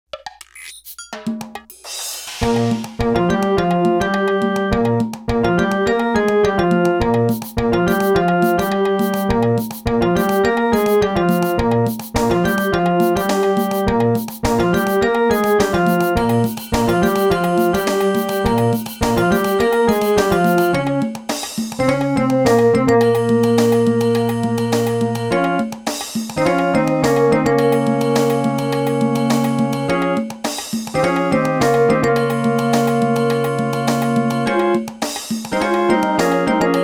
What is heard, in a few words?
Ba Ba Do Ba Synth